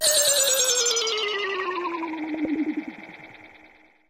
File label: gameover; blitzmax; hitoro